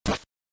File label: suplex